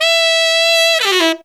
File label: TEN ELF, Phantom Horns TENOR FX; Zero-G